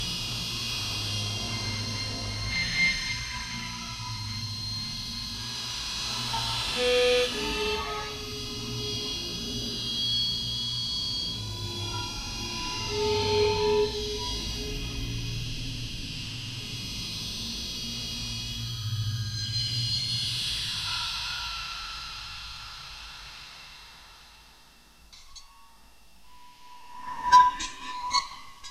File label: metallic shovel digging w